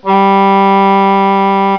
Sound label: overtone